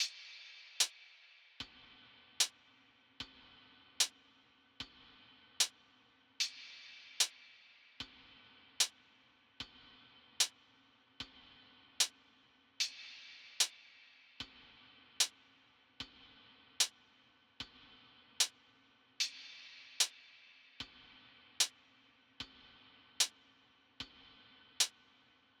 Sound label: 150 BPM C# Min Hats; Cymatics; Code Of Honor